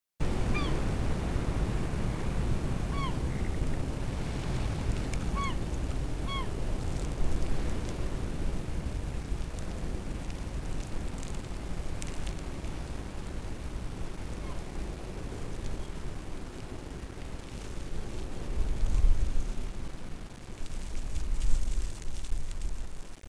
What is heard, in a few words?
GULLS